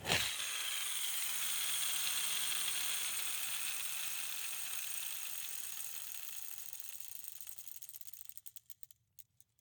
Roller skates, old fashioned, all metal, wooden wheels, swipe, spinning, slow to stop, lingering; Eiravaein Works; Vaeyan IV